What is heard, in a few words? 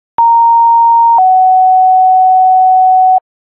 amb, tones